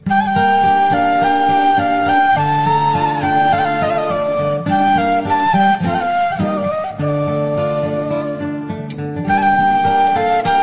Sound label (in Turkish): fon music